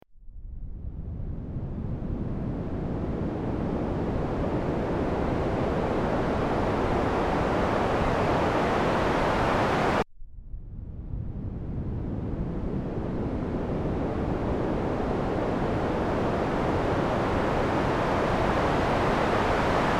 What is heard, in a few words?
lo pass saw